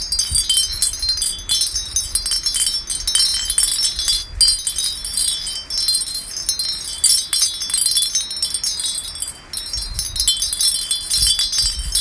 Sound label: Wind Chimes